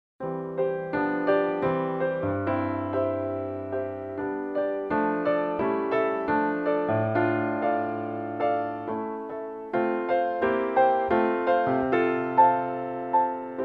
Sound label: For Music Accompaniment